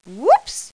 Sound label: bummer